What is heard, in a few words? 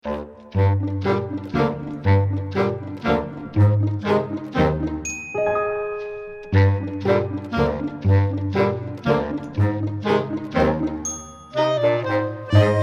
Brass Fun